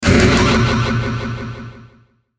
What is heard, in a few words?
Hit Stab Delay; sound design; Stabs; Blastwave